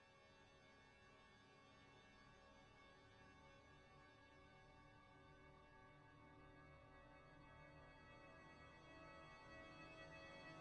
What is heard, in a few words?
tension by brass; intensity by design; sonniss gdc